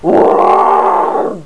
low growl; Prima v0.1.1

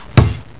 poum; Mobiclic51; LANGUES

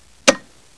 CLICK

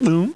Gulp; Princess and the Pea; SoftKey

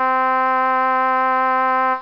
synth

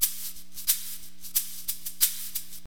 SHAKER, Perc

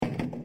step; dungeonmaster

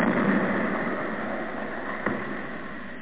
shoot; amiral

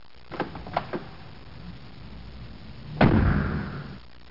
slam

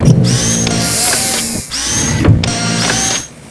boss idle; Zaero; monsters; bossz

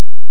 SHHH; Know Your Baseball - Greatest Player

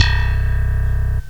Slap Bass

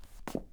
foley foot scuff; Earth and Beyond; EA Games

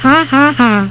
Hah hah hah; Concentroids From Planet X

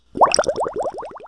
sink

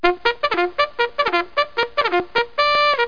bugle

